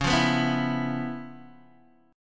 C sharp 7th Sharp 9th Guitar DADGAD, chord

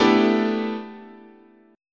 A 7th Suspended 2nd Suspended 4th, guitar chord

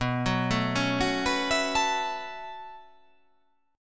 B 9th Suspended 4th B down

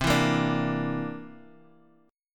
C 9th C down Guitar Half Step Down

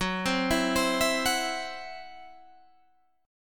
E Suspended 2nd Sharp 5th

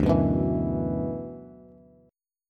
D 6th Add 9th, Bass; 10,9,9,9; strummed; JGuitar

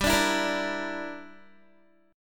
G Minor 6th, Guitar; Gm6 strummed; 14,17,16,15,14,x

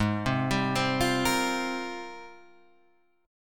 G sharp Major 13th, Guitar Half Step Down

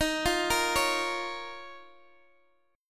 Eb 7th Suspended 2nd, Guitar, DADGAD; arpeggiated; x,x,13,10,13,11

